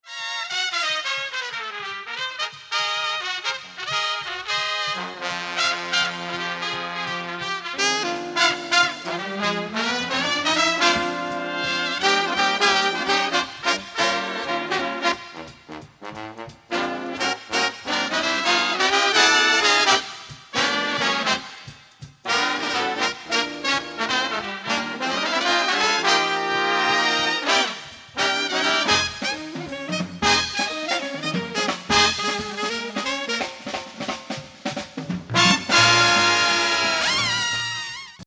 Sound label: Big Band